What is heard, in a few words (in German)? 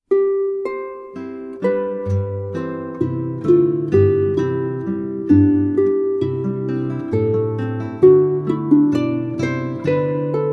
Marsch; weihnachtliche saitenmusik